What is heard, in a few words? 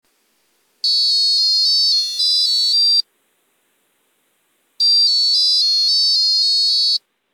Sounder; pic chips